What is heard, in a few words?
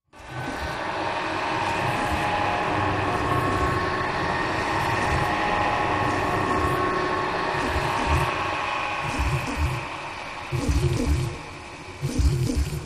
Ghost Washer Machine P, eerie ambience